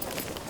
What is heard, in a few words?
tac gear, gear rustle; Gear Rustle Redone